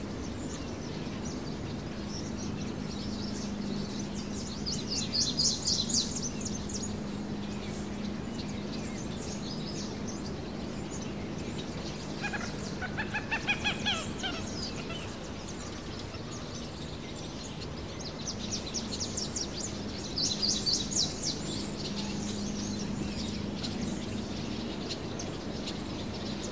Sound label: ambience day; ott3d